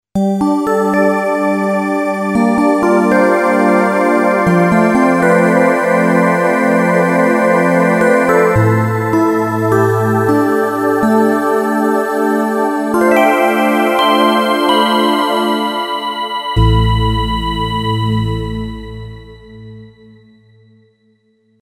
dream pad

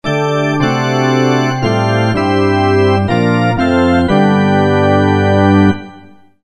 Hammond

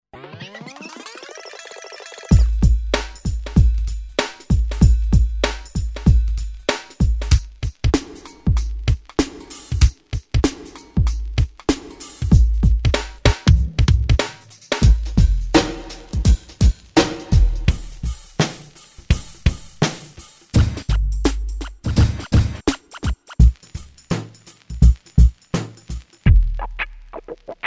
loop drums

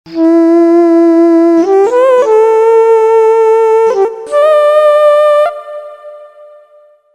Etnic solo

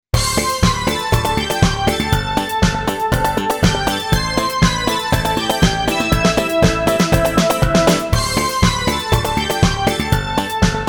Akai style

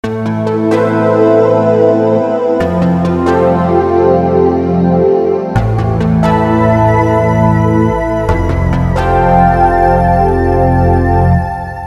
Big pad

